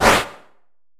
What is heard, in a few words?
attack; Kayne Ruse